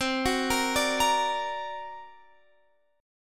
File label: D Augmented 7th C, guitar